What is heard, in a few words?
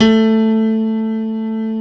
DIDI, FM BASS; AKAI S-Series CD-ROM Sound Library VOL-7